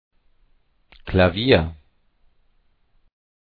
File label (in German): Klavier